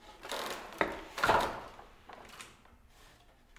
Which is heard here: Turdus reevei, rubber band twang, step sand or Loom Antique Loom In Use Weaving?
Loom Antique Loom In Use Weaving